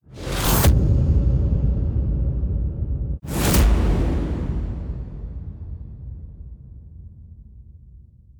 Cut Scene Stingers

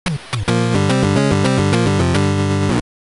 Level Up; G.I. Joe The Atlantis Factor; NES

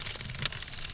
Sfx Minigame Microscope Twist

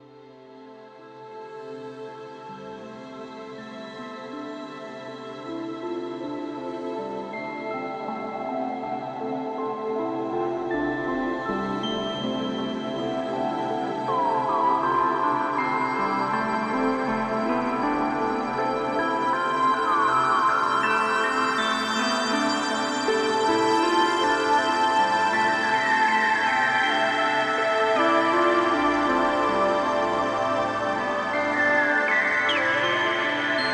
Pasture Positive Ambience; Planet Alpha

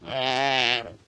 Llama Mom Scream

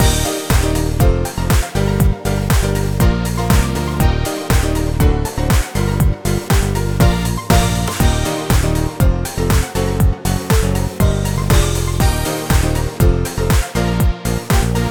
Warming up Bpm120 C

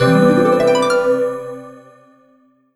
End Panel Sound; Emma Lost in Memories

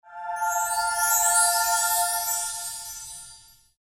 Chimes; ShellShock Live; Android

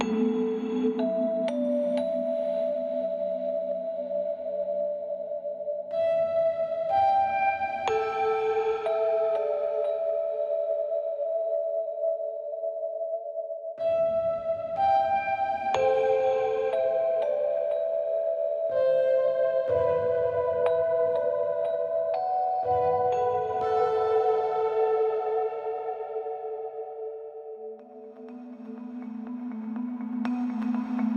Desert Music, Melody Hermit Song Melody

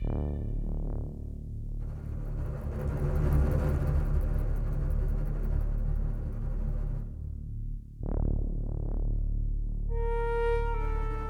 Cinematic Loop; the solitaire conspiracy

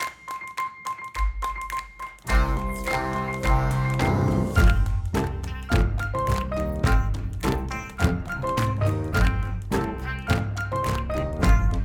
Scene Start Long Version Loopable; Unspottable; Xbox Series X|S

